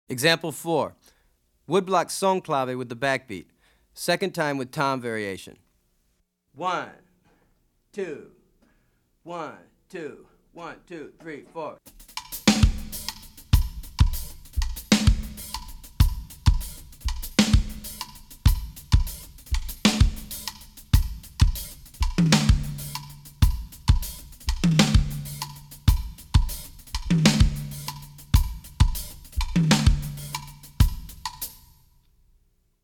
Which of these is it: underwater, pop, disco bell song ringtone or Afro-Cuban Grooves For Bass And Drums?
Afro-Cuban Grooves For Bass And Drums